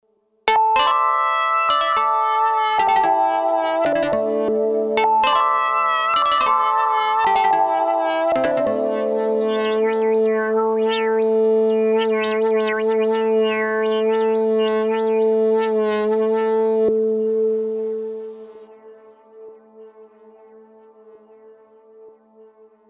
Model FM Avantgarde

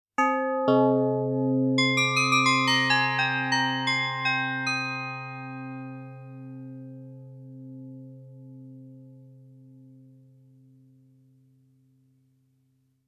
Doorbell resynthesis

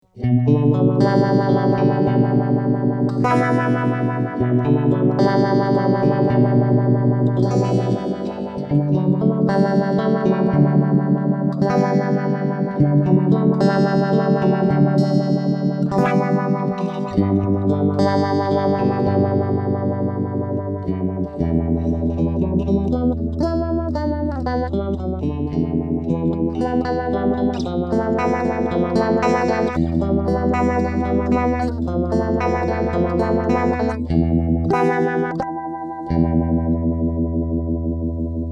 Lush Roto

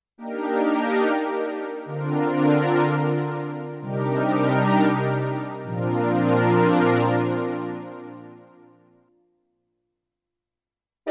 Roland VR 730 Strings

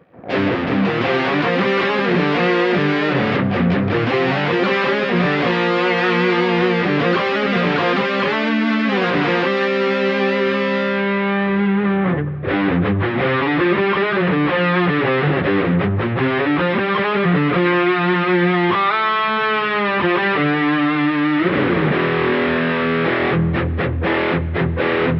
Pitch Shifter Mode Perfect 4th Down

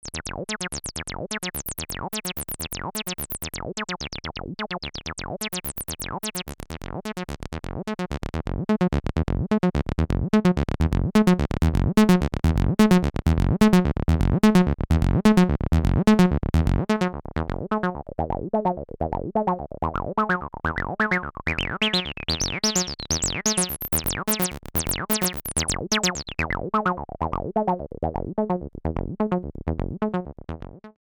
128BPM

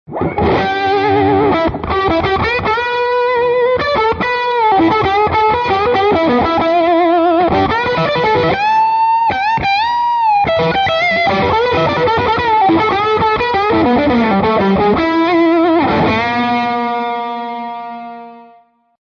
Blues Lead

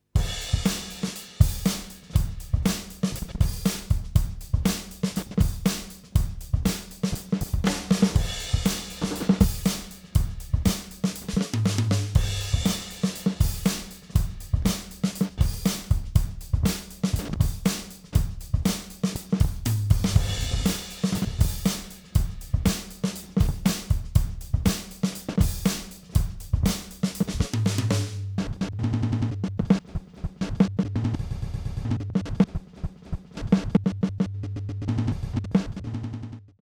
Glitch B Drumloop Dimension

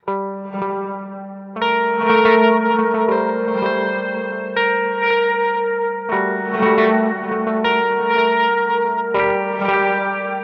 Red Panda Context V2 Reverse

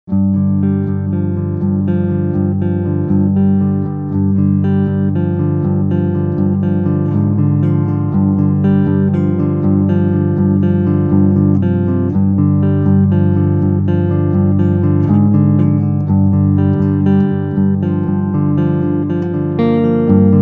Clean Picking Fronthumbucke

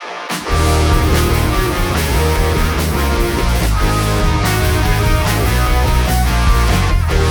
rock drum transient removal

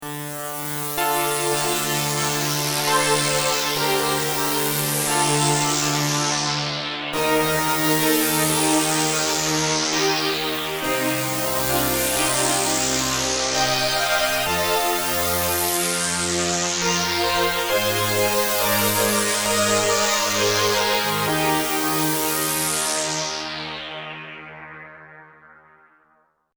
UDO Super 6 Resonance Modulation

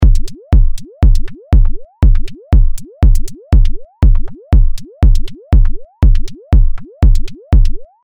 stompin kick + drum synth

